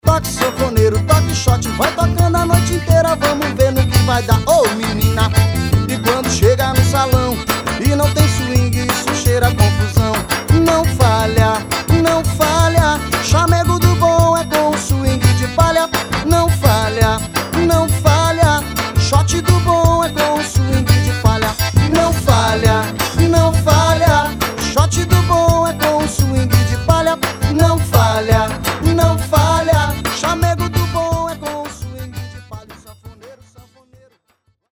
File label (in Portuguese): Toque Xote